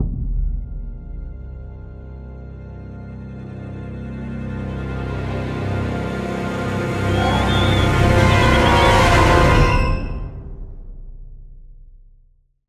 Sting Horror

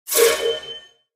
Portal Gun Portal

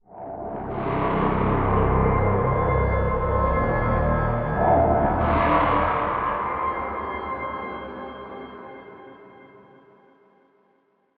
Quick Tension Stinger

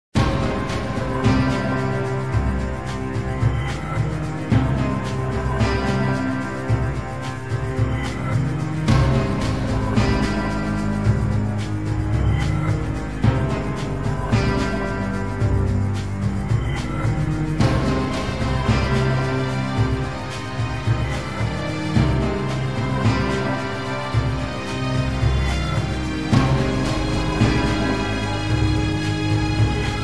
Sitar

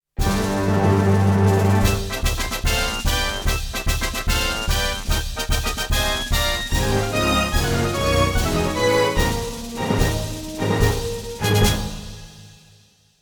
world clear jingle

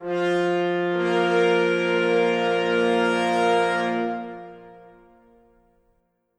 Music Fanfare; Fable Anniversary

